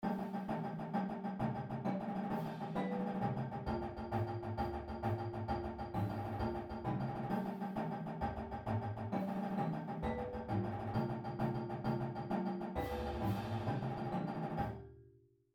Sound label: Accents Fives, Drumline Exercise; pdlmusic; Drumline Ensemble Series